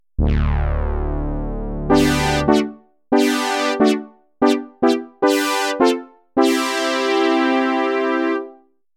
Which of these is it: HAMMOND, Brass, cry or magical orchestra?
Brass